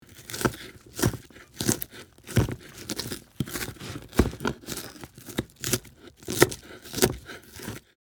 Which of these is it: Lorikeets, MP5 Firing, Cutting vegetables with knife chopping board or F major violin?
Cutting vegetables with knife chopping board